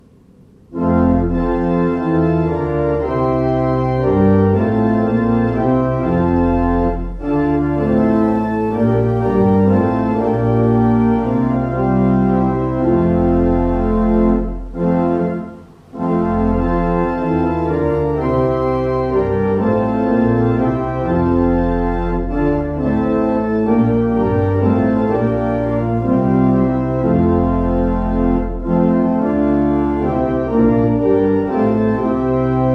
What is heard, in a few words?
Osmond; Gloria